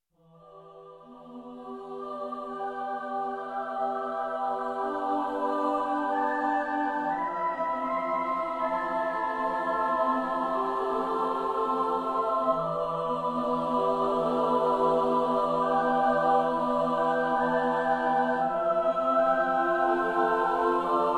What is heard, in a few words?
Choir